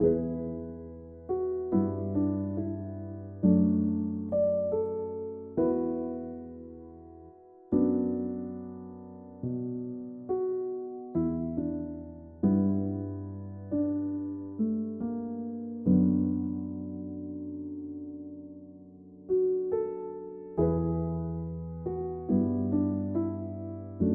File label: bat piano short